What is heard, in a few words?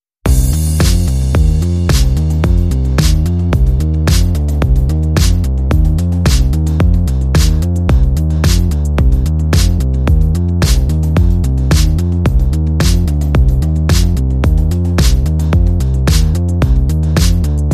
Bass and Drums